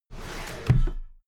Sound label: drawer cabinet paper close heavy halfway